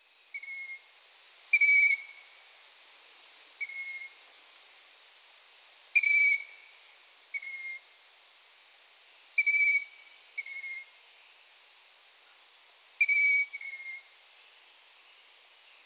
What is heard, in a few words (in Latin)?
Asio otus hlas mláďat